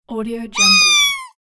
cartoon meow

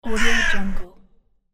crow cawing

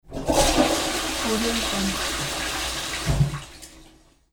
toilet flush

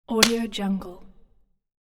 slap

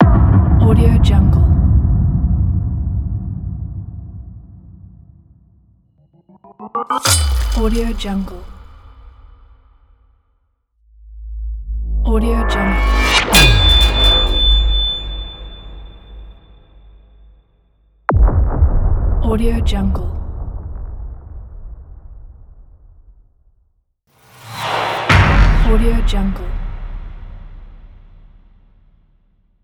massive hybrid hit

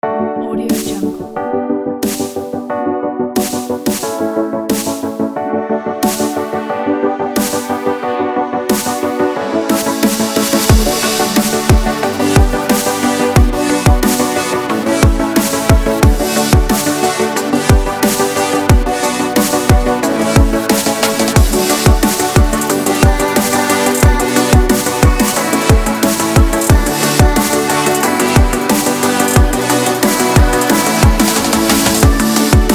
uplifting future bass

